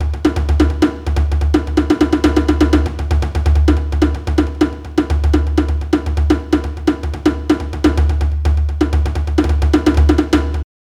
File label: djembe; fete de la musique 2017